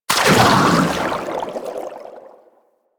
boost thruster; Warframe; Sharkwing; Vehicles

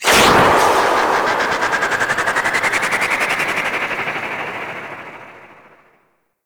Engine Boost Slow; Warframe; Vehicles; Archwing Healer

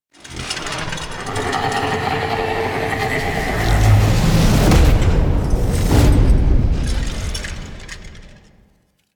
Rise And Step Down, Throne Room; The New War; Sentient; Erra

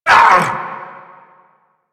Vocal Pain; Warframe; Duviri; Dax; Vocal Set A

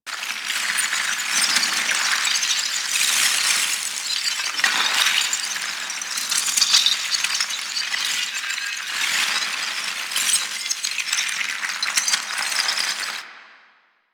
Rally Loop; Warframe; Warframes; Hoplite

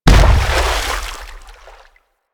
Infested Step Water, Enemies; Warframe; Femur

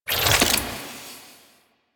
Sniper Zoom In; DE; Warframe; Tenno; Quad Sniper

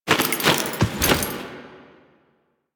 Parry Finisher Foley; Enemies; Finishers; Drifter; Warframe; Duviri; Dax

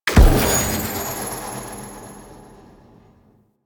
Squad Link Beacon Pulse; Warframe

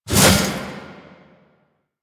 Mace Whoosh; Warframe; Duviri; Duviri Dax; Weapons; Duviri Mace And Shield

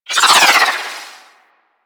Arch Wing Prime Dodge Roll Right; Movement; Warframe; Vehicles

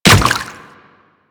Water Step, Footsteps; Royal Guard

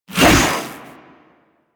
Briefcase Katana Whoosh D; Crp Briefcase Katana

